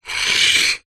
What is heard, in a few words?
foley wood small block thin light scrape on hard ground; zapsplat